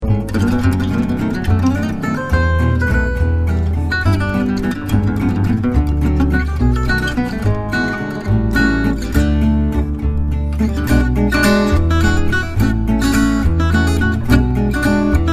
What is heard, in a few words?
Musette Guitars